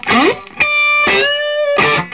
SOLO, Vintage Blues Guitar; USB Soundscan vol.22; AKAI

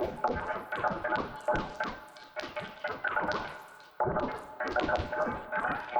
Tonal Re Draw, sparse soundscape

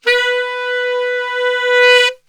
B2 sax

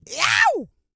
E YELL, MALE HUMAN; ILIO; Vocal Planet

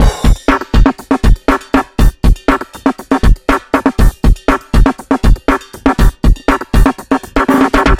machine hip 120bpm, Downbeat Dance Grooves

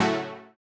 Housey Stab A2; KG MegaSaur

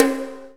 TOM, Drum Dry; East Collexion; Drum 1

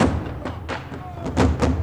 drum 130 perc, drumloops

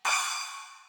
crash, Millenium MPS-400

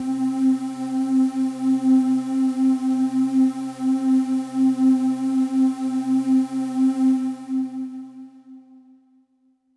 Pad C, Synth Prophet One Shots; Electronisounds

